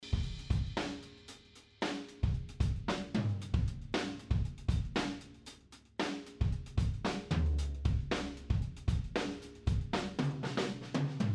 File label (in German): Drums nur Roommics; X32 Drumrec Mix-Evo